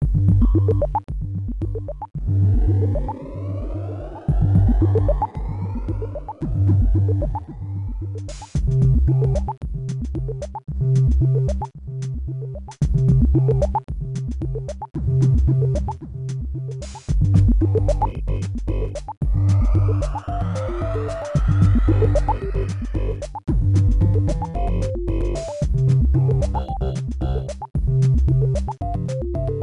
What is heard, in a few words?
Main Ambience; solvalou namco system 21 arcade